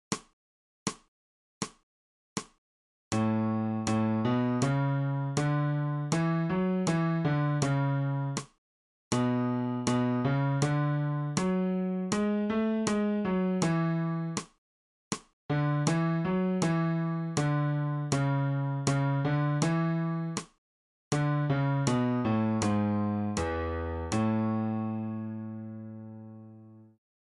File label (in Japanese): 新フレットレス